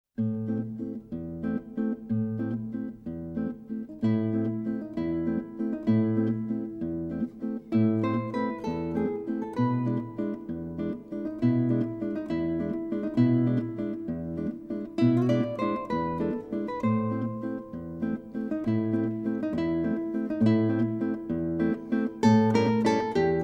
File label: walzer; sapporo guitar